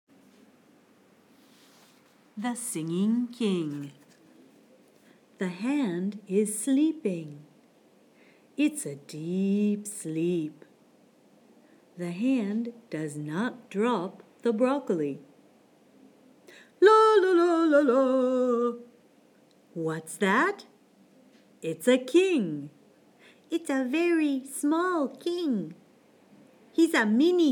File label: The Singing King